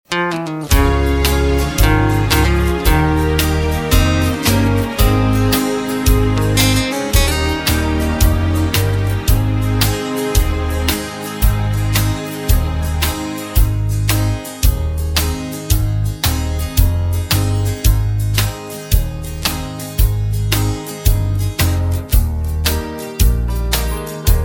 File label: (Ab To A) No Backing Vocals